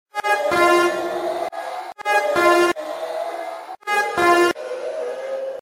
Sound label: Alarm Modus Extreme